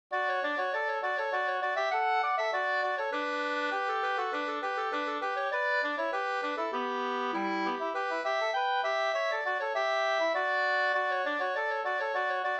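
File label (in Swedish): slangpolska, two-part; Vrigstad Sm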